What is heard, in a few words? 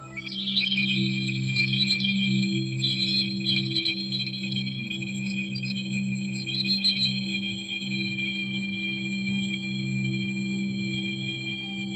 ambient nature